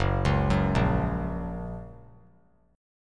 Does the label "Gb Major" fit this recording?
Yes